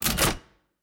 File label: Safe Success